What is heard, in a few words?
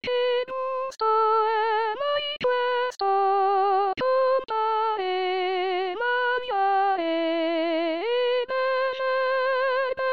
che gusto canon; 2019 baroque